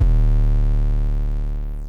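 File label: Distortion 808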